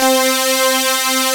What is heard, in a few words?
Aud Synth C4; Digital Sound Factory Xtreme Lead